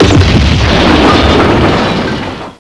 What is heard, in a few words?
NUKE